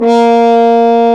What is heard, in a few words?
BRS F. HORN; Roland L-CDX-03 Disk 2; French Horn; F.Horn 1&2